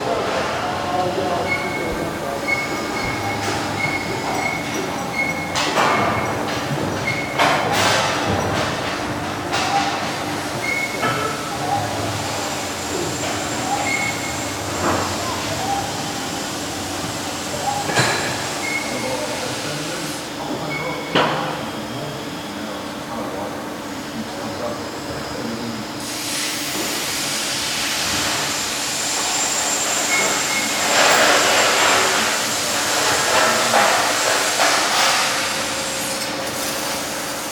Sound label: Industrial Warehouse Construction Ambience; AMBInd; Jake Fielding; Symphony of Steel - Steelwork Ambiences